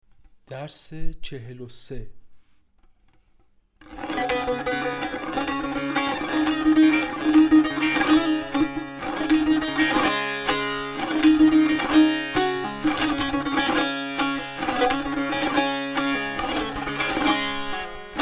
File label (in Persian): مشق تنبور; تنبور جلد دوم